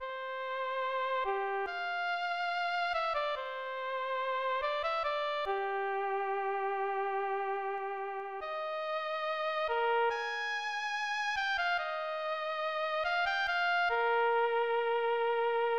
Flute; Holy Umbrella; SNES; Armorshark

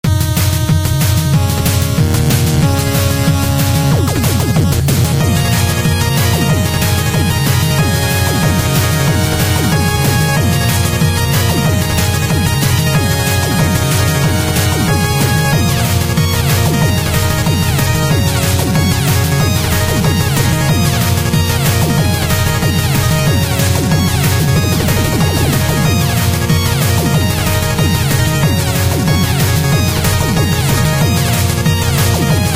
Boss Music; timothy and the mysterious forest ps4 switch windows gamerip 2019

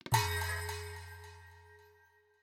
Recycle Box Success

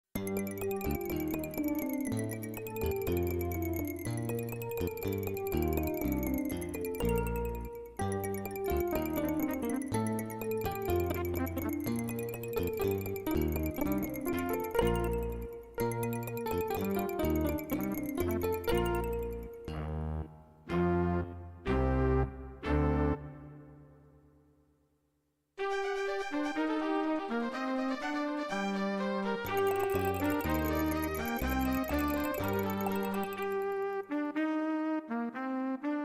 Fugue

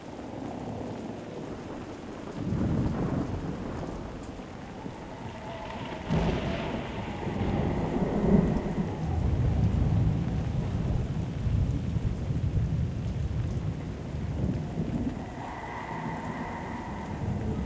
Amb Tower Stormscape

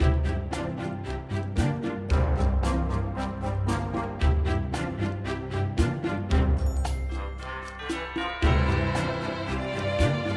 Ingame Loop; Scrubby Dubby Saga; Android

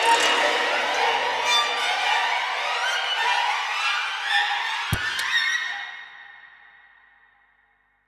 Knock; Inmost

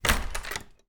door; Geometry Dash

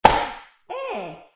Peeing poke low

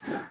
Turn Sfx

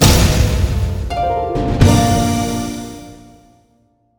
Ui Finish stinger

